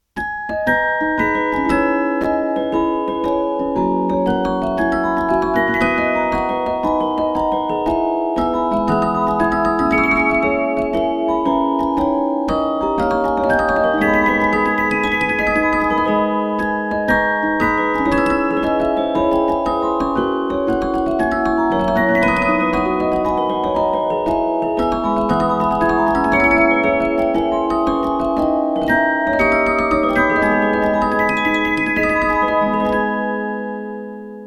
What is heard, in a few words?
Spieldosen